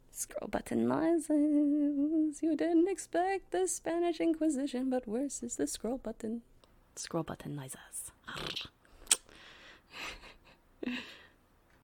Scroll Button Noises; MoonSilkAudios

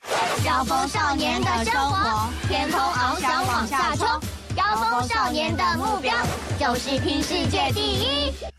Chant, Chinese; Junior Speedsters Chant; Season 1

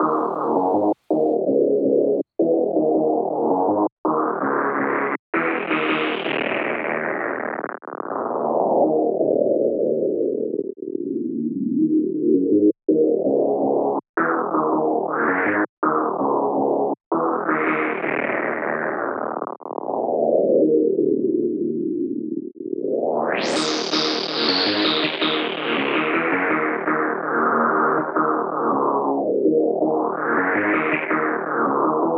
Lead synth, 81.5bpm; Pony Fan Music Remix Stems

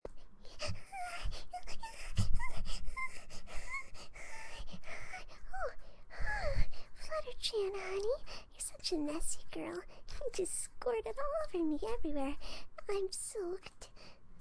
moaning; Fluttershy

